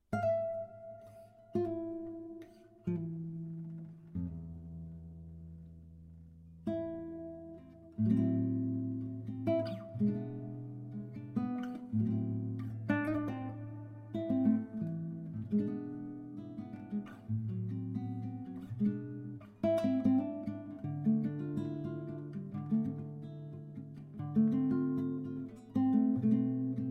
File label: Solo Guitar